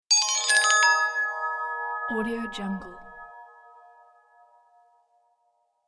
magic diamond

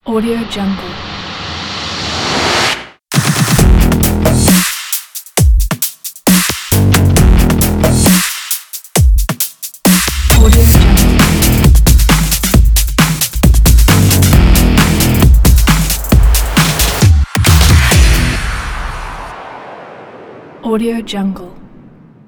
modern EDM pack; pow wow

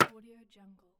metal ladder footstep; stormwaveaudio

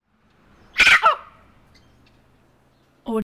mangabey scream

